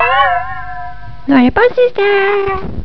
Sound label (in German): Posteingangsbenachrichtigung; Win-Sounds